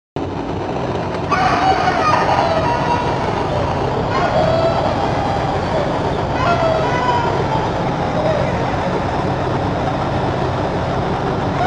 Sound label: radio; Milk outside a bag of milk... OST (2021)